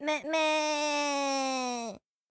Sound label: deer howl